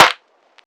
subtle reverb snare single hit D# key; snare drum samples

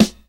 rich lows acoustic snare sample g sharp key; Mono; snare drum samples

